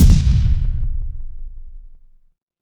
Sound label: transition impact hit